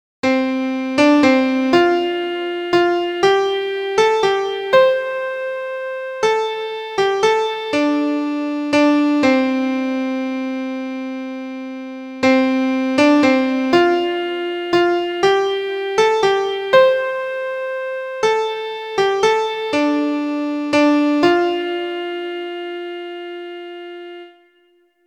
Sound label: pentatonic